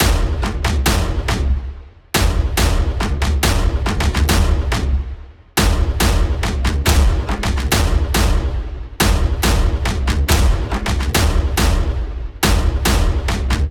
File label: Drum